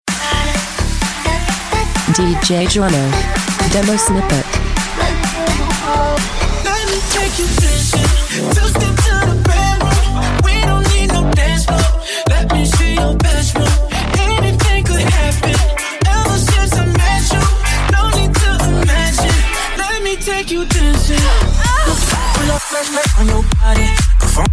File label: BOUNCE